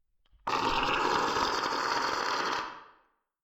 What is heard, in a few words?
Alien growl